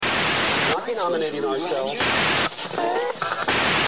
som radio; Anos 80

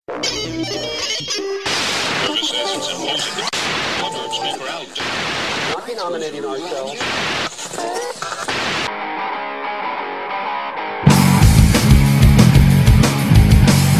trecho rock; PGTIAE 2011 - Ritmos Musicais

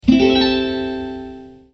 plim; instrumentos

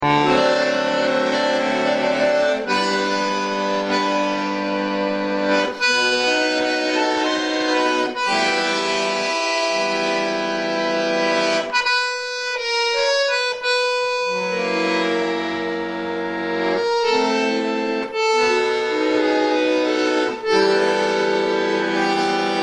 acerto bandoneon; instrumentos

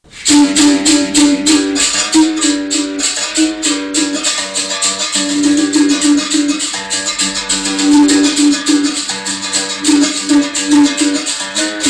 acerto berimbau; Instrumentos

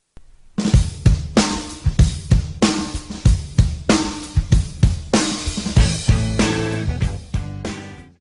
bateria; instrumentos

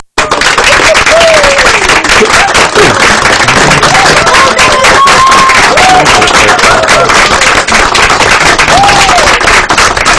aplausos; Instrumentos

